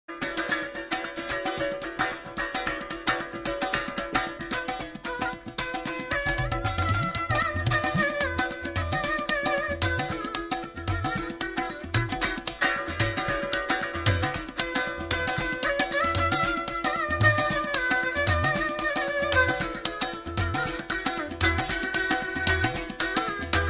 Balochi Tone

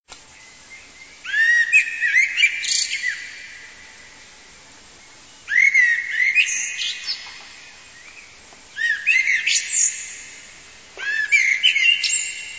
Morning Bird

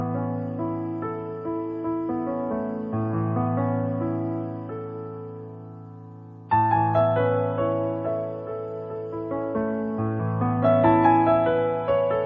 Soft Piano Music

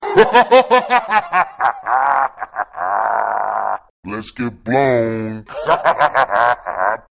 Demon Laugh

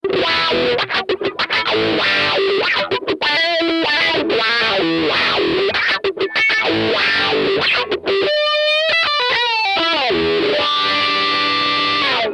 wah wah

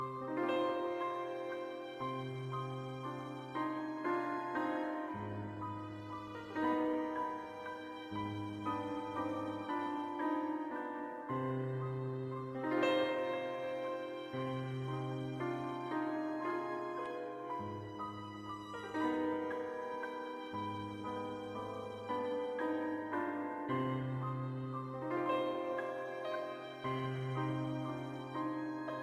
Piano; Max Payne 3

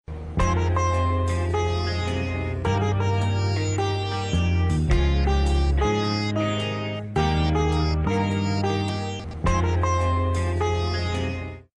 Success Love Music

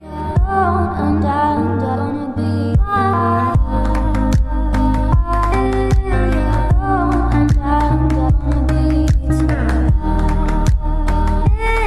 slowed

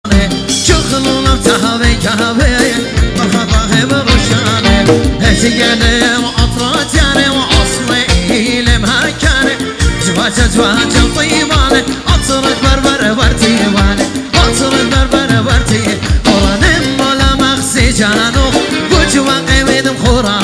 Good Assyrian Song

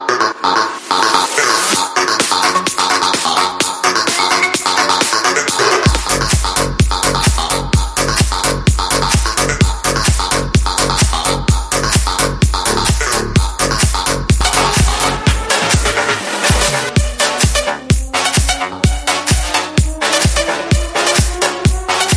melbourne bounce

